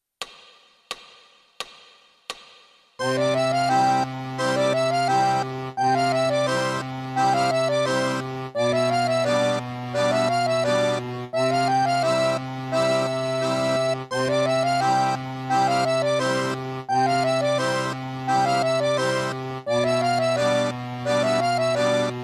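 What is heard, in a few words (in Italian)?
Sedicesimi, Fisarmonica Tango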